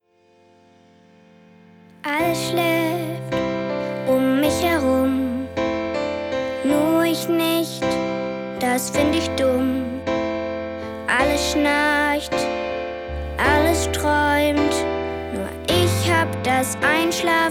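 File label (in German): Songs, Mädchen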